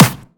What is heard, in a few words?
natural acoustic snare sample E key, snare drum sound tuned to the E note; snare drum samples